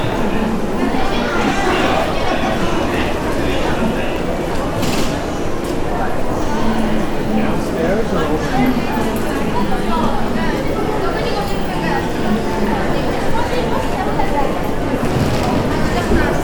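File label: Shinjuku station evening; JR新宿站1/傍晚; Tokyo; 新宿車站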